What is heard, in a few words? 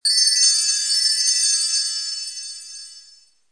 summon Sparkles; merge-dragons-2